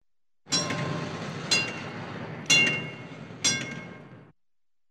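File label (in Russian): трамвай старый колокол звонит несколько раз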